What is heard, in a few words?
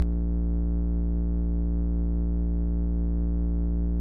Hum 50